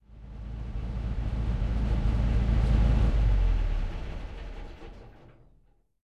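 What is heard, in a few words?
centurion engine interior stop, tanks; engines; War Thunder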